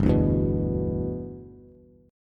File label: G 6th, Bass; G6 strummed